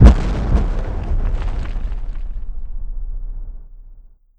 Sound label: Far Explosion